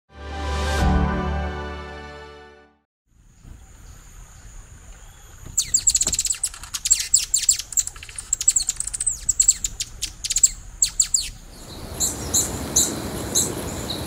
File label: Hummingbird Sounds, Chirps and Calls